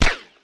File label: bullet; p1000